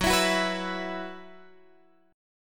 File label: F sharp 7th Suspended 4th, Guitar, Open G